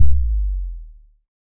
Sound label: a futuristic digital boom; depth